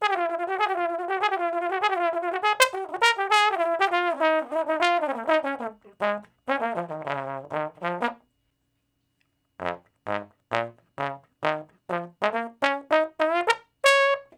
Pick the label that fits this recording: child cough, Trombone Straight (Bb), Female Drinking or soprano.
Trombone Straight (Bb)